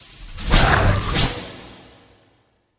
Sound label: Wolf atk; Seer; GamigoNA